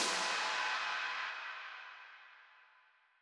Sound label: drum Loop 140BPM FX